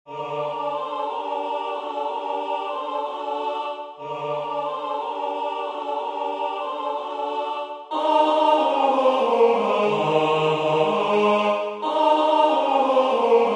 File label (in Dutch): Bas1; A.Dvorak; Holub na ja vore